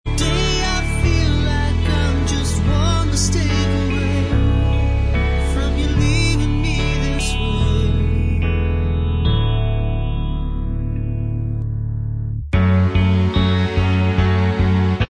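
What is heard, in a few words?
backing vocals track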